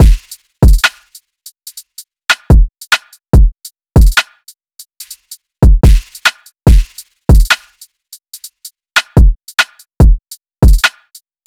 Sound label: Drum Loop 144 BPM, Drill; Drum Loops; Arrived; Cymatics; GHOST (Beta Pack)